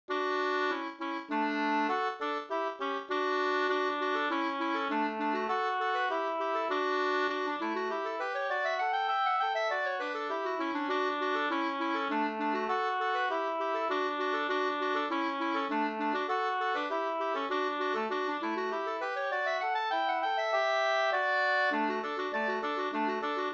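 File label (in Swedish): slangpolska, two-part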